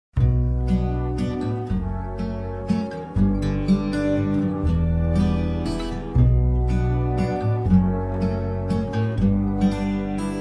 de valse zeeman, pays-bas

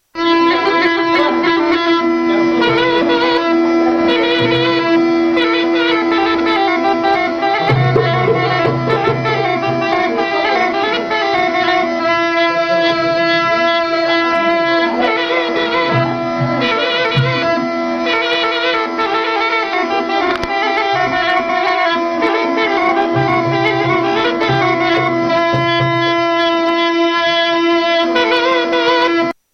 arghoul; instruments

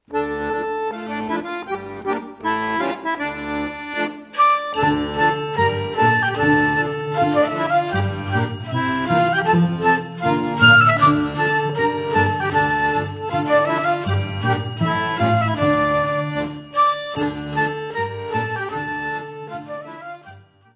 Schottisch; Belgique; Wezembeek

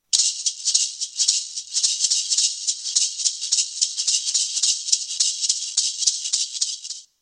maracas; instruments

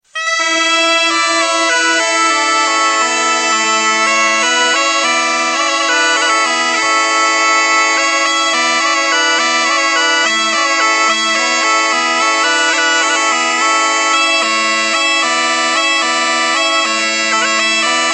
zampogna

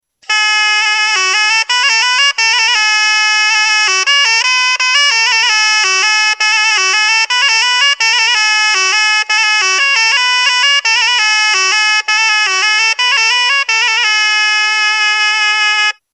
algaita; instruments

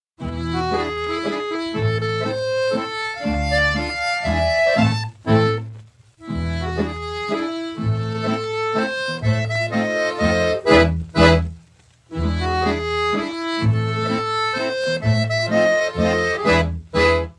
Waltz - Pologne; Waltz Matthew's

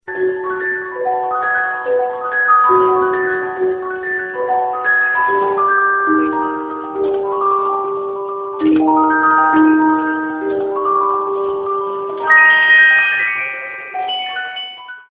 celesta; instruments

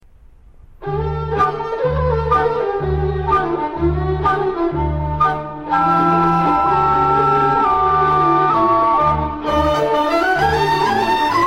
Horo; Bulgarie

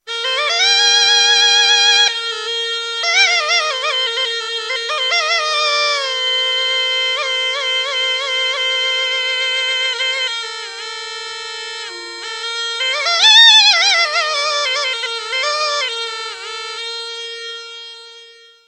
pungi; instruments

